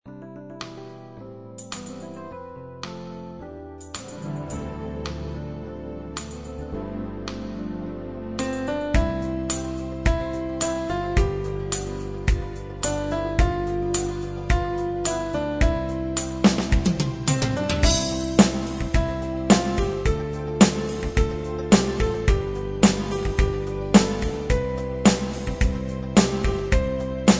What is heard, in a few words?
ballad